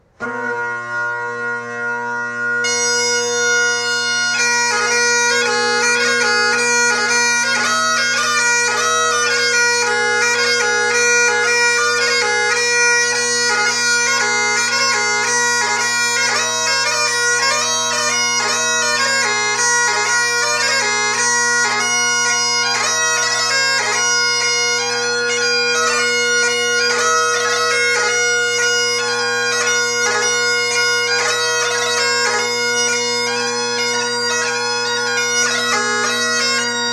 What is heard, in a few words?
ewe with the crooked horn